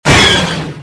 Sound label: garand